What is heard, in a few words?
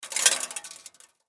Impact Debris Small Drop Metal Surface; Sound Effects:Materials; Blastwave